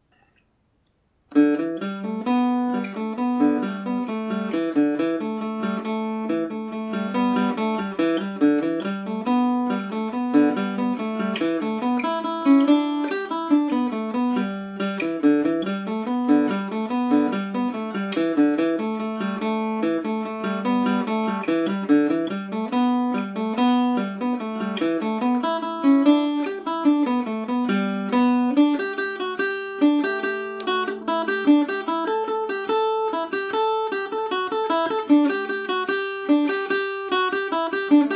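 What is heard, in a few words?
G major at 40 no repeat, irish mandolin; mullins favourite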